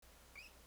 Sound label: Snip Squeak Amplified